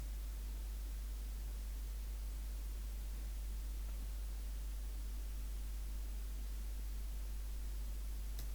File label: Audigy mic 20dB